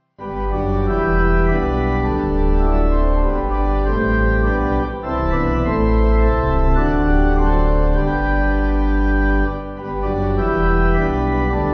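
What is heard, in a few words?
Pipe Organ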